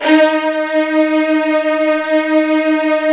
Virtuoso Strings

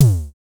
DSM2 Tom; MPC DSM-2

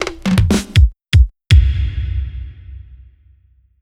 Fill, Drums, Eclectic Electronica

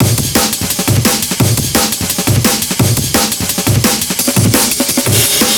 amen 172bpm, breaks, breakcore

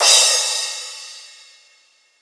Crash, Drums Cymbal; House; Crashes; Best Service; House Definition VOL-1; Cymbal Stuff

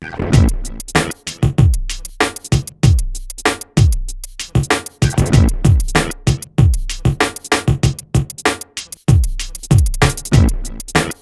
MIX, Grunge Hip Hop; USB Soundscan vol.34; AKAI

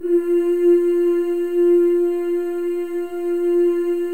FEM, Symphony of Voices; Spectrasonics

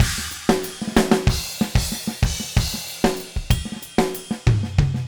Heart Samba with Fill, Latin Percussion, Drumset; Cha Cha Tag Return; Sonic Foundry (Sony Creative Software); Joe Vitale Latin Percussion

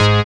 SYN, DANCE SYNTH

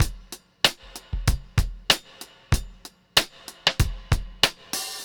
Todd 095; Zero-G; Monster Beats

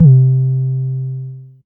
808 - C3, Kicks; Mbase 11 Kicks

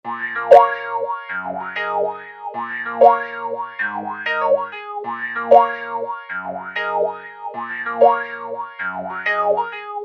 Heavy FX Paino; Construction Kit; HIP-HOP; Sonic Foundry (Sony Creative Software)

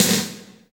SNR RATTLE, Snares; Roland - Rhythm Section; Snares 6; Sn Modules 6

